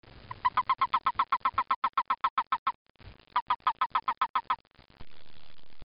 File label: bufo boreas western toad